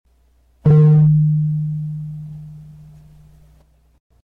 long conga, drums; paia

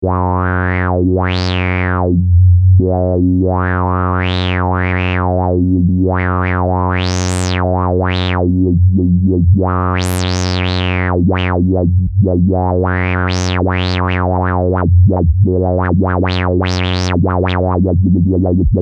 LFO tri; tellun

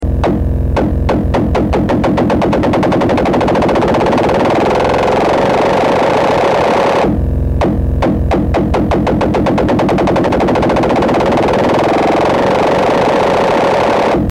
bouncy bass chirp

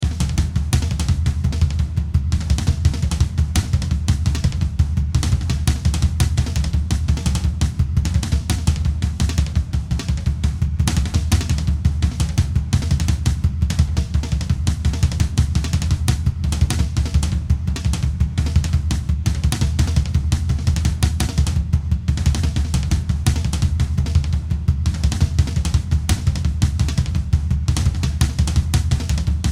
drum thing

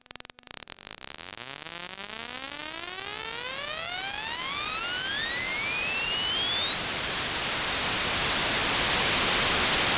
random pulse sweep

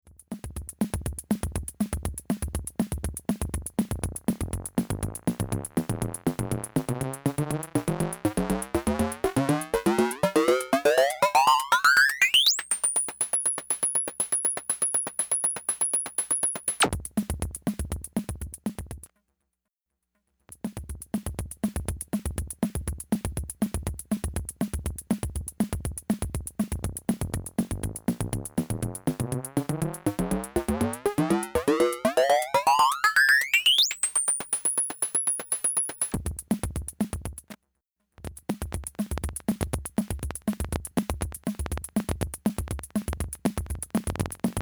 Ring Modulator drums

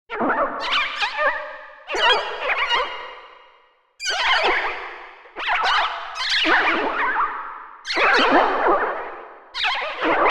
Pro-One noise; tellun

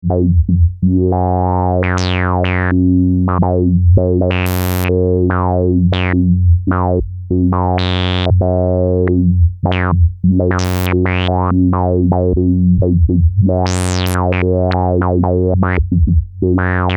LFO saw; tellun

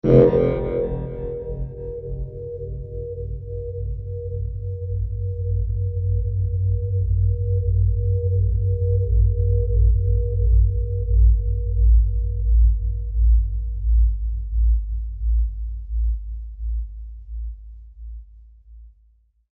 bass pulse out; tellun

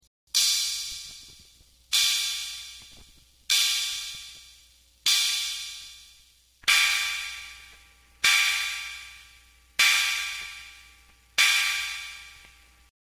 no noise hi lo, dr110

